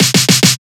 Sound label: snare fill; Drum One Shots; SD MIDNIGHT Sample Pack; Slate